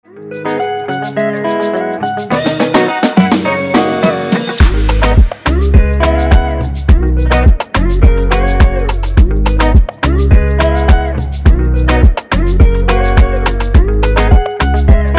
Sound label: afrobeat drums; jumuiya